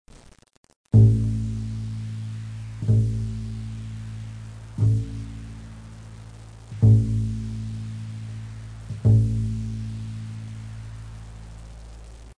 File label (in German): Kontrabass, Ton a